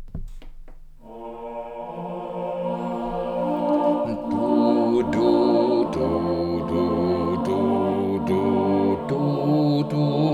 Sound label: Bass